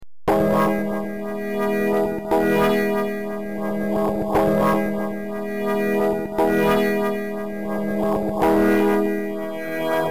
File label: picnicbass